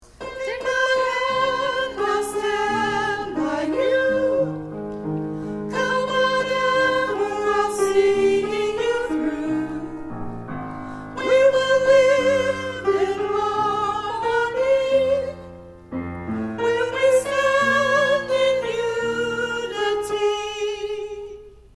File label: soprano